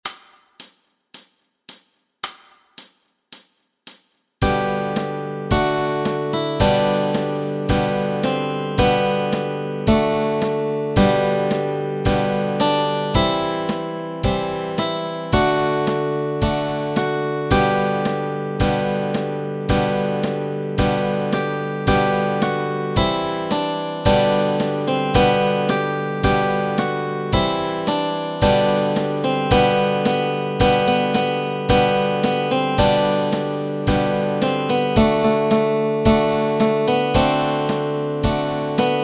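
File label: melody and chords, Easy Guitar and Ukulele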